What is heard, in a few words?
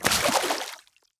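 water run, footstep; skyrim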